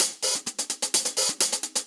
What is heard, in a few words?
Real Hihats, 128BPM; Loops; Vengeance Samples